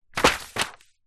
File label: paper sheets many pile drop on floor; foley; zapsplat